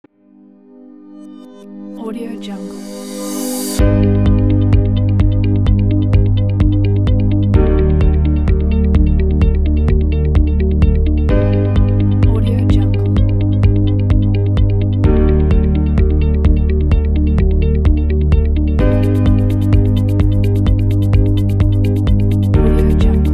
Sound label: presentation